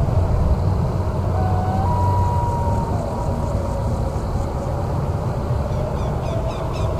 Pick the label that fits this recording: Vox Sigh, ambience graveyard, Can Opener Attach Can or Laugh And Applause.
ambience graveyard